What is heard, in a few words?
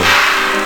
HIT, Hits & Brass